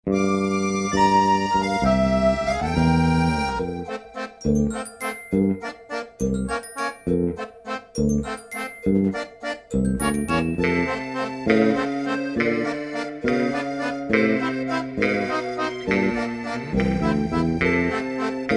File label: underwater theme; SMW